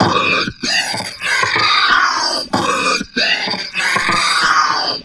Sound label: OLD THROAT, TALK BOX; Spectrasonic Distorted Reality 1